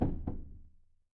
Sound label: impact wood knock